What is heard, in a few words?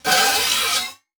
Transition Metallic Phaser; Production Element